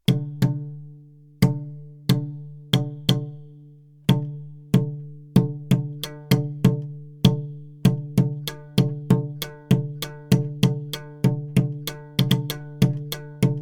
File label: cello; uto gardon; eastwind